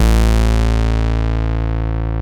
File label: TEISCO, BASSES